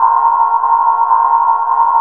DRONE INDU, Synth FX Misc

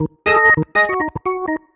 ORGN 123, Keys; Houseworx

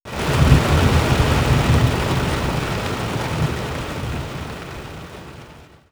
Nature, Rain Thunder; X-Static Goldmine

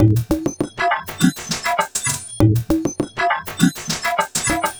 Tubulation Beat; Sonic Foundry (Sony Creative Software); Myths of Technology; Composite Beats

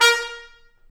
BRS TP, Roland, Stabs; Roland L-CDX-03

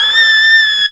my sax; Zero-G; Funky Elements

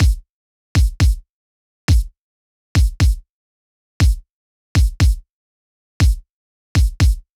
Kick, 120bpm; Construction Kits; Zero-G; Nu Disco; Nicengroovey C

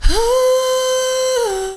H-oooh; Mo' Phatt; Digital Sound Factory Mo' Phatt

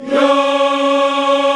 VOX, African Yas; Roland LCDP11 Africa VOL-1

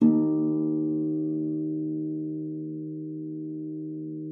FLAG D3, harp; Xsample VOL-8; HARP+FX

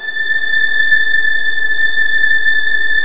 A4, Virtuoso Strings; ILIO; Kirk Hunter Virtuoso Strings VOL-1

